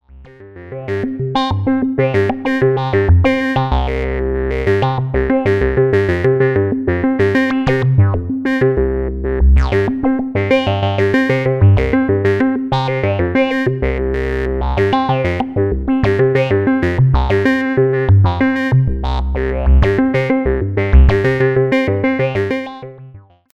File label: phaser